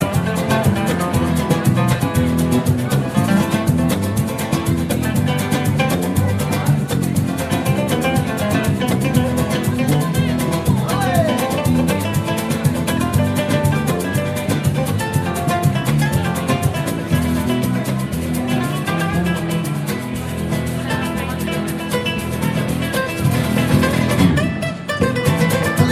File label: rumba